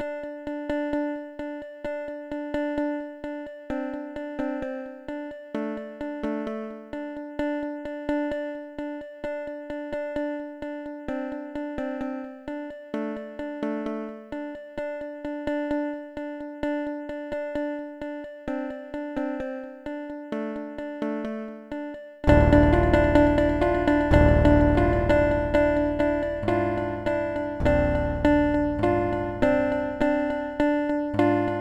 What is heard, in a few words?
wave sequence; Music-X